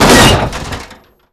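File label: debris metal medium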